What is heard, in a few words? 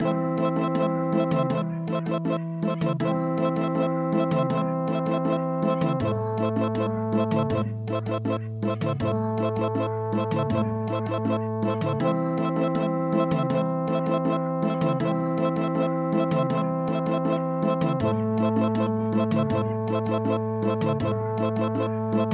Andes